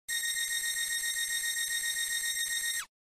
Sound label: camera laser